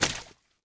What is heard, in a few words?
bodyfall mud